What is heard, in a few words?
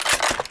reload, Rifle